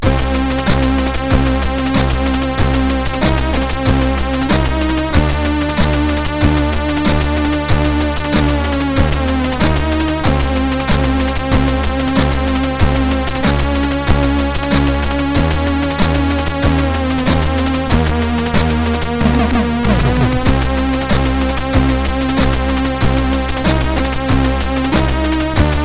Horror, CSG8580R5

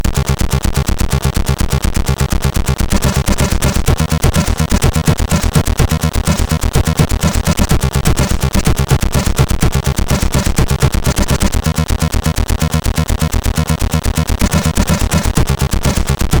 Splash Crash, MOS6581R3; sid

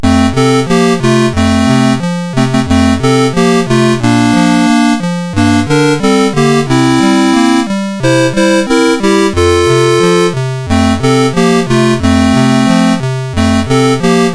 Jingle Bells, MOS6581R4

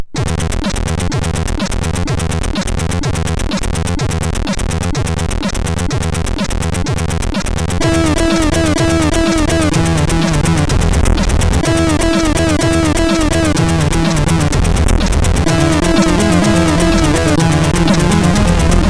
MOS6581R2, 8BitChiptune; Syntax Error

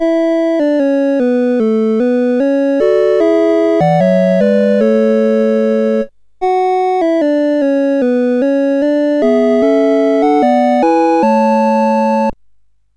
menus, sid CSG8580R5; Games Summer Edition

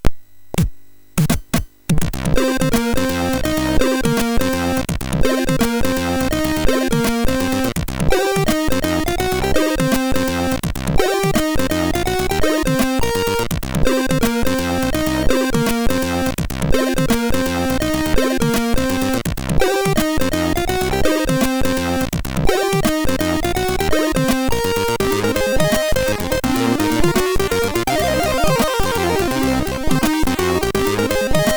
Jazz and Blues, MOS6581R3

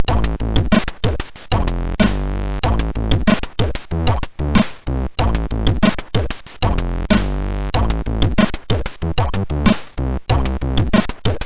Rap, sid MOS6581R2; FBI or Die

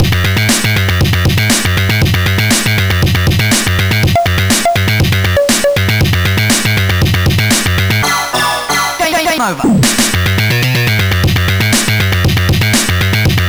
Digi Tune sid MOS6581R3

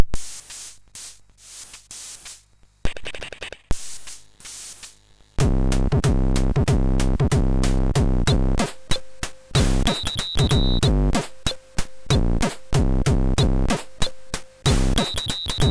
sid MOS6581R2; Graffiti Intro

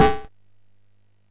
SFX, MOS6581; Narco Police

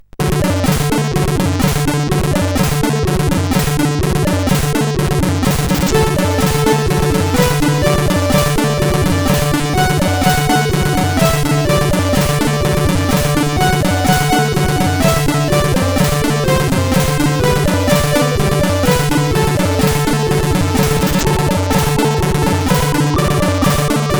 Railroad Blues; CSG8580R5

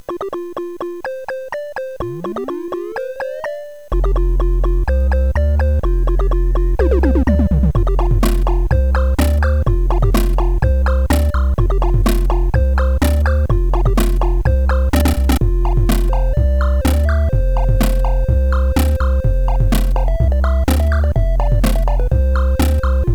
Radar and Plate, CSG8580R5; sid; hvsc

